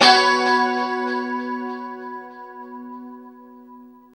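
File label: chord B 4, guitars; Spectrasonics; Hans Zimmer Guitars VOL-1; JC-SHEEN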